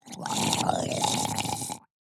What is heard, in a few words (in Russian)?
Drowned idle; minecraft